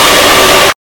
jumpscare; u 60 hoax

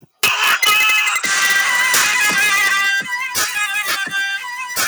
boom

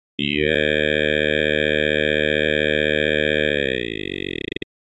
yaaaaaaaaaay

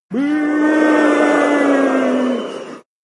buuhhhh

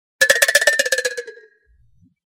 jelly shake

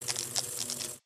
laser hit

